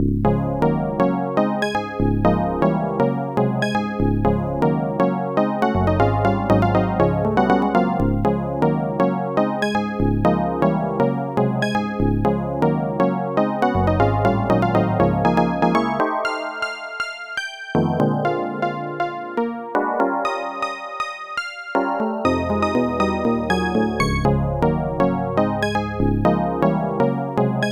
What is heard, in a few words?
rhythm